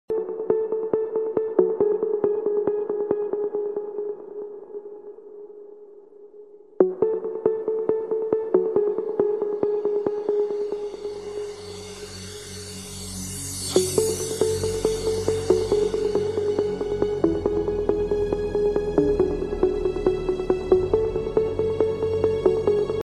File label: beep trance ringtone